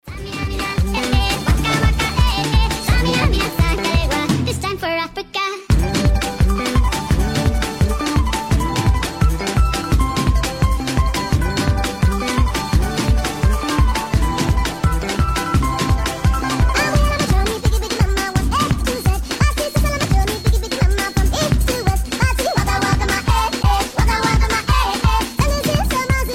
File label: speed up